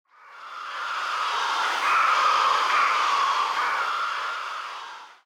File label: heartbeat; superstriker